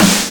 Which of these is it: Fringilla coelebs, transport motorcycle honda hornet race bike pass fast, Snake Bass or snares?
snares